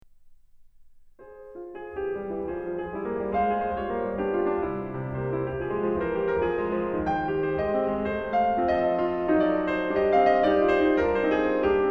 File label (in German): Klavierstücke Op. 118 No. 4 Intermezzo in F Minor